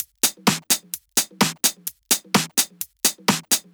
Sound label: Drumloops 128BPM